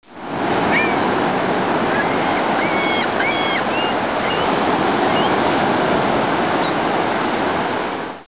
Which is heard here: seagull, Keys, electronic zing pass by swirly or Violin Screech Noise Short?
seagull